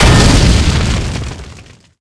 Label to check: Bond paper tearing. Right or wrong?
Wrong